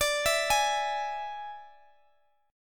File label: D Suspended 2nd Flat 5th D, Guitar, Standard; x,x,x,19,17,16; arpeggiated